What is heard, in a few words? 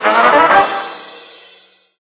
Emergency Succes